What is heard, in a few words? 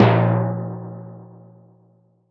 Timpani, Percussion